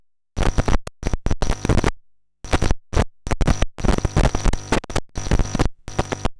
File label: Noise